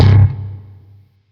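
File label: Sentient Shawzin Slap Octave One C; Chords; Warframe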